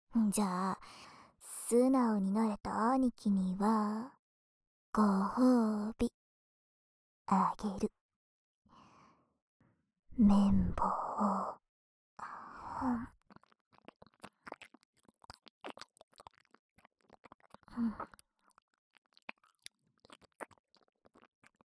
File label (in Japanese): 【左】唾濡れ綿棒, 耳かき, ASMR; 耳かき小分けトラック